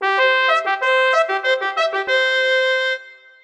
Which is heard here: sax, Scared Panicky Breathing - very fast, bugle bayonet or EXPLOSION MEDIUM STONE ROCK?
bugle bayonet